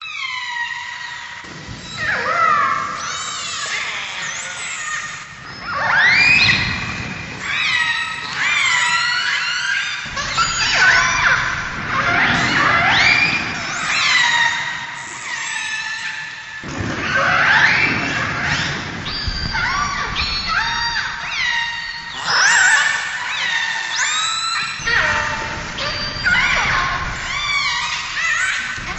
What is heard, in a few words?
balina sesi whale so